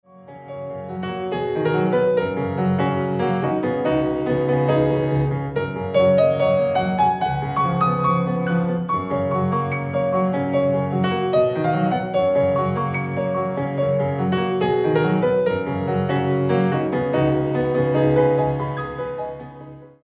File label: Sound And Impression Of Dialog